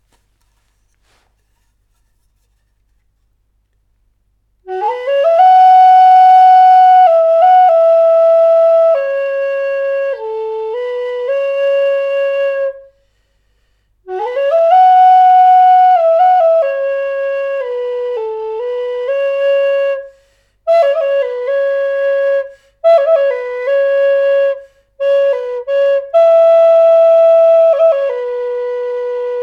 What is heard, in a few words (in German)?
Flöte NAF Stimmung Fis 440Hz